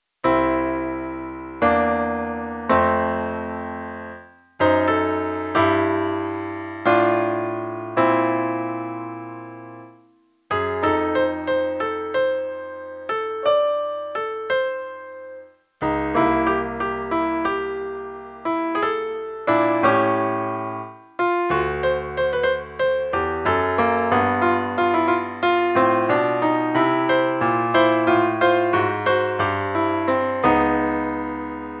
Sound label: Gospel-Blues; Manfred Schmitz